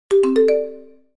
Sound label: message, notifications; Gionee; Elife-E5